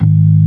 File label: ORG B3 G#1; Best Service Gigapack 2